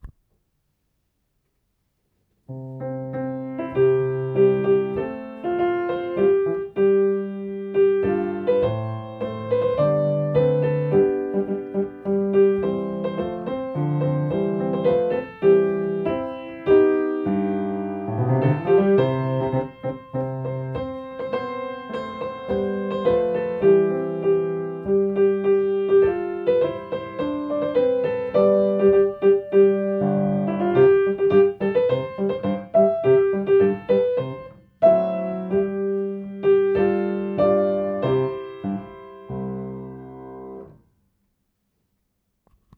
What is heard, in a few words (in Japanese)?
piano; kouka